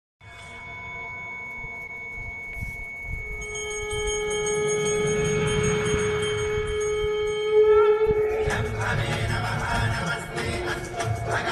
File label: ringtone bgm; Swag movie